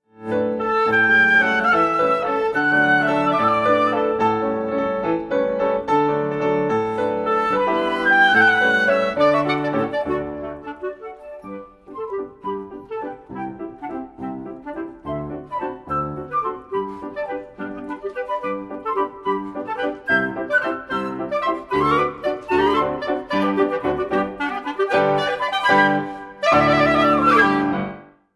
4 waltzes for flute clarinet and piano; Dmitri Shostakovich

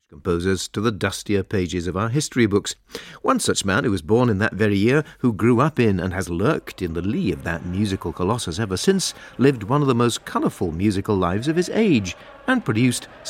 arr for contralto chorus and orchestra; rule britannia; arr malcolm sargent

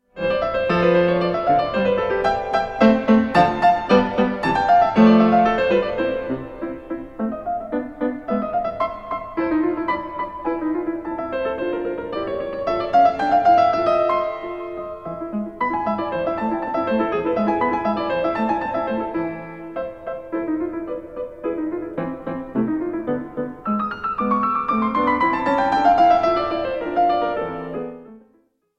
sonata for piano in C major rondo; wolfgang amadeus mozart; 3rd movement

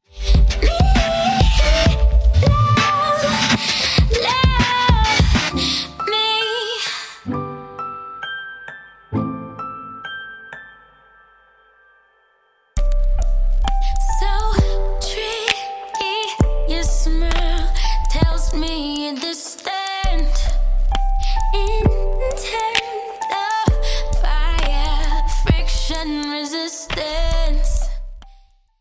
music box

